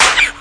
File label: spank squeak; Weird and Interesting Hits; single hits